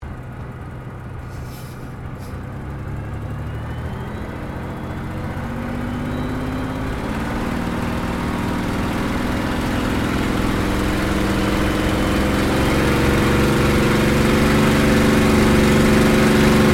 AIRCRAFT SUPERMARINE SPITFIRE MK-VIII TAKEOFF INTERIOR DISTORTION; Airplanes; Airplane Sound Effects